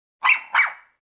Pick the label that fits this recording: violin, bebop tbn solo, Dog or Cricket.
Dog